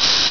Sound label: AEROSOL